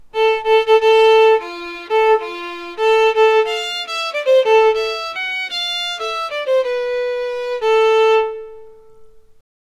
staccato violin

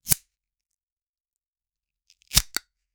open cigarette pack crink, crinkle

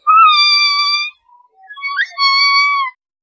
happy squeaks excited meo

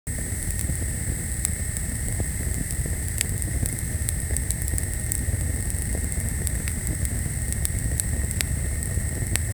fire

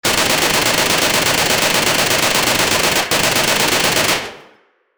rotating machine gunfire

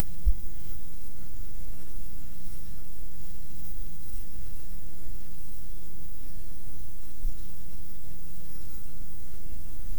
a short crisp user interf, navigation

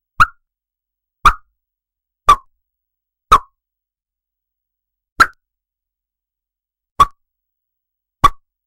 a sharp whip crack as

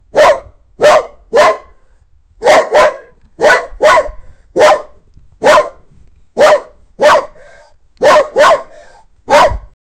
bark

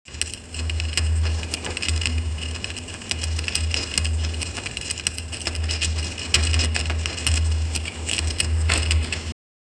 a secret door sliding mad, mechanism

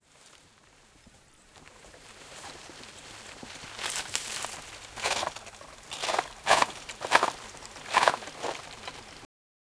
dead body being dragged

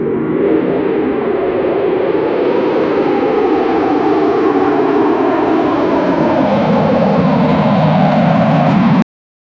fighter jet engine on gro; vehicles

